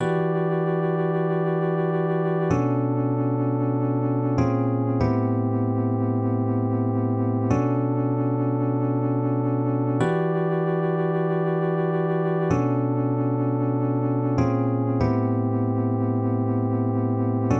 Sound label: Rhodes Chord 96 bpm; Sun Vibs In Your Eyes sample